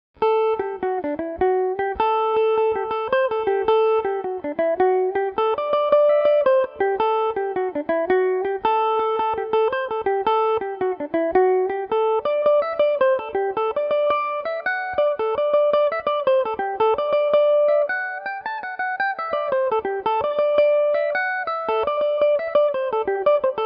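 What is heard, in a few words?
A dorian, irish mandolin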